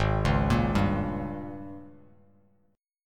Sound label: Ab Major 7th Suspended 2nd Suspended 4th Ab, down, Bass Standard; arpeggiated; JGuitar